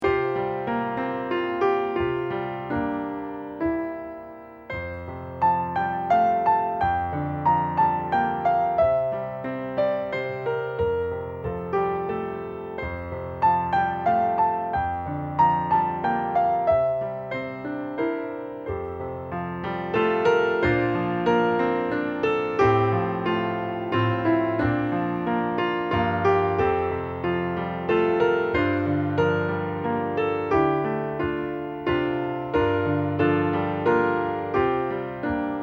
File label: accomp